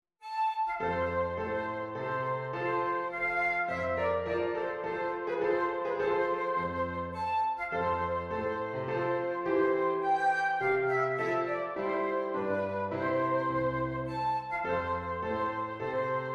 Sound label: Minuet and Trio; il mio primo concerto vol1; Schubert